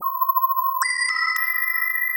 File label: Quasar Whistle 110BPM